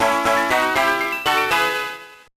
Starting Line Jingle; cars mater national championship gba gamerip 2007

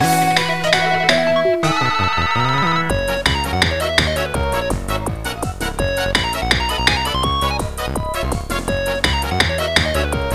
Music Park (Final Lap, Drum); Mario Kart 8

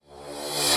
Action Street; The Amazing Spider-Man 2

